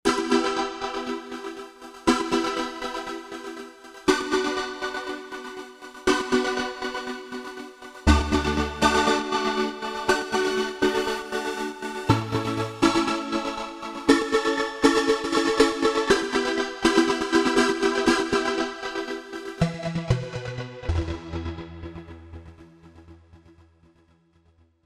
Gated R&B Filla, Novation UltraNova; Factory Patches

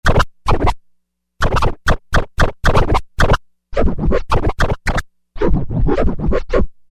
Scratch 6; Roland SRX-05 Supreme Dance

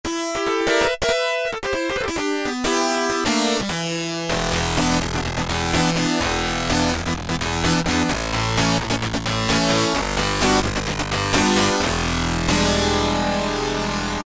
Fuzz Piano, Yamaha TX81Z

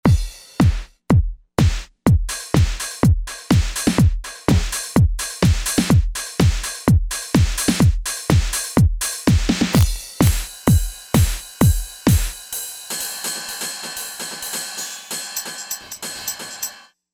Techno Kit

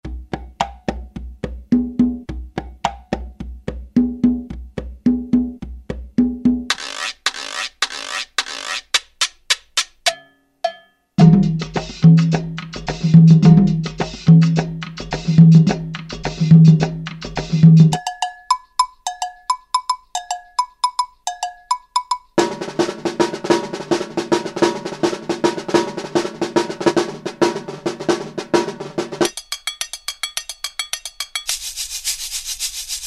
Loops & Prc 2, Alesis Z7 Latin

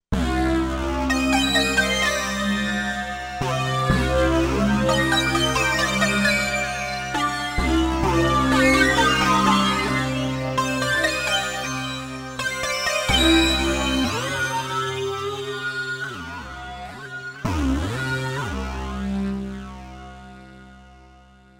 FeedIndiaBck, Roland V-Synth

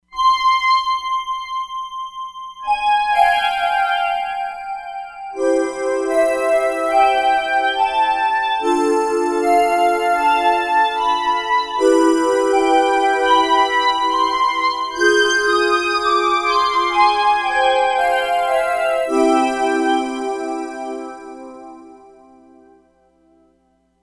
Arctic Vista, E-mu Proteus-3; Factory patches

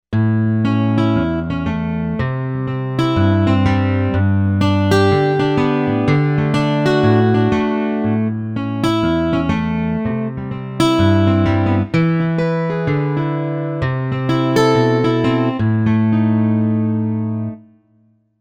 Zing String

